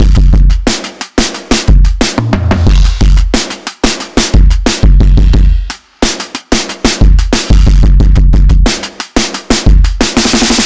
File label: Finger Drumming